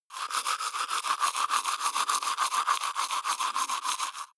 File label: teeth brush wet open molars